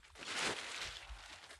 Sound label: Trudge Step, outdoor, Footsteps